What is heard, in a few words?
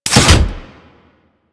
Door Open A; Warframe; Ambience; Grineer; Grn Door